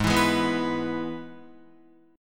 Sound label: Ab Minor Sharp 5th, Guitar; Abm#5 strummed; JGuitar